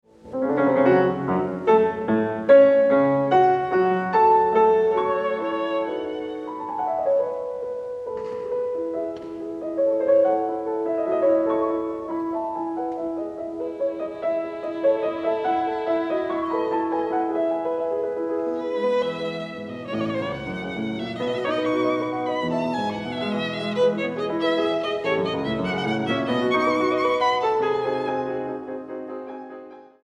Sonata for Pianoforte and Violin No. 6 in A Op. 30 No. 1 I. Allegro; Beethoven